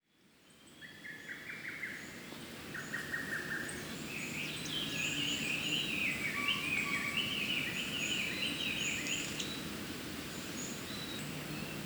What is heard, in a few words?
bird